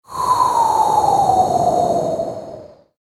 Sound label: airy smooth transition